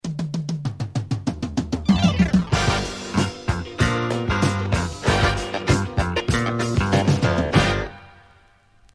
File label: Jingle